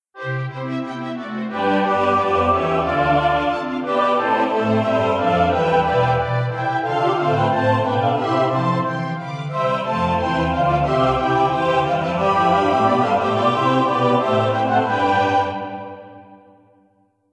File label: Instrumental